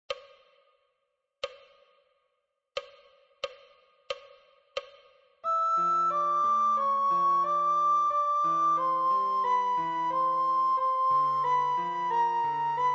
sopraan; Flierefluiter 7

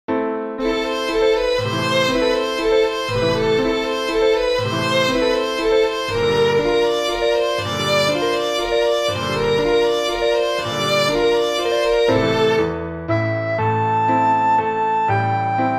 sopraan; Flierefluiter 6

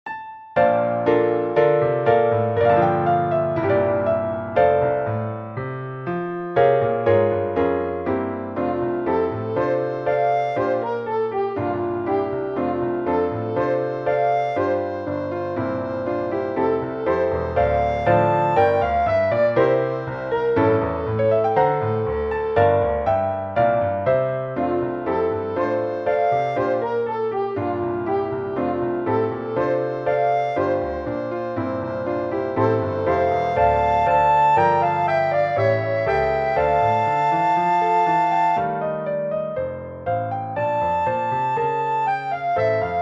duet alt; Tureluurs 3

